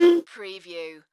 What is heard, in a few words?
Straw Push In Movement